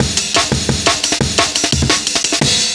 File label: DNB BREAK